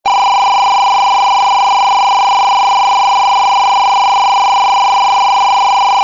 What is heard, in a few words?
tone